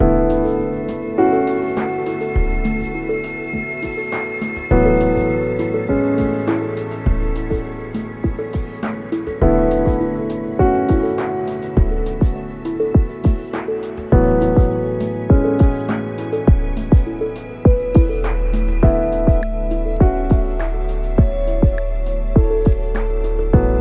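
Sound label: background music field garden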